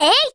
女人嘿哈声; renlei

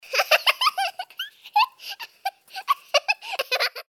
蹒跚学步的小孩哈哈笑

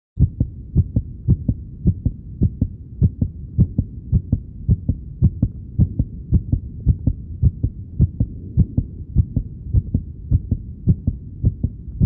心跳和脉搏

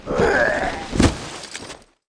壮男 死亡 倒地

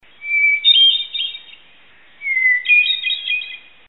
像口哨的画眉鸟叫声音效

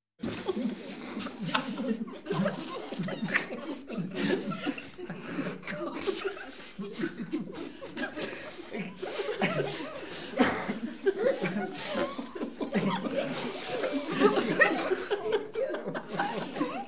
偷笑 男女 混合 成年

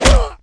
女被拳击中; renlei

